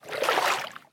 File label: shallow step; Original TK Sounds